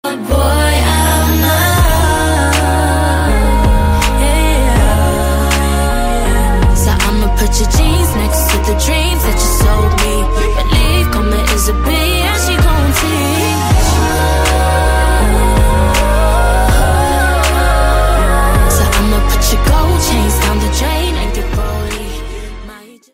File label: Cardboard Box